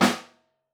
Snare Room; Ableton Foundation FreePacks; Snare Designer

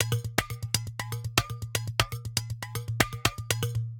Water Bottle Kit; drum racks; Ableton; Factory Packs; Lost and Found

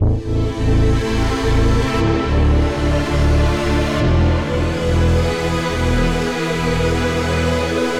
Moon Pad Split, Synth; Ableton; Factory Packs; The Forge by Hecq

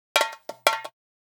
Hip Hop Hi Perc, World Percussion

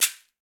Caxixi Hand; Latin Percussion; Drums; Drum Hits; Shaker; Ableton; Factory Packs

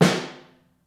SN2 Room, snare; Ableton Foundation FreePacks; Snare Designer

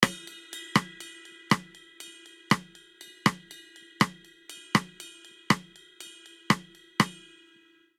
Bossa Nova Ride & Snare 120 bpm; 4 4; MIDI Clips; Ableton; Factory Packs; Drum Booth

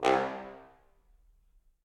Bass Trombone Solo Staccato; Ableton; Orchestral Brass

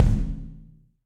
Kick Felt Low Loud Squashed; Drum Hits; Ableton; Factory Packs; Session Drums Club; Standard Hits

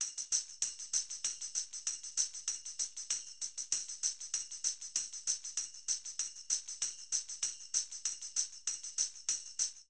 Soul Tambourin 97bpm, Latin Percussion; Ableton; Factory Packs

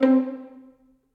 Violin Section Pizzicato; Ableton; Orchestral Strings

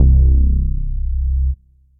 Bass Driver, synth bass; Pre Dawn Bass Driver; Ableton; Factory Packs; Singularities

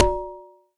Alien FM Perc Short

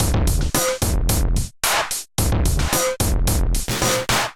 Kit groove 110 bpm; Abuse Kit groove 04 110 bpm; Ableton; Factory Packs; Drum Essentials

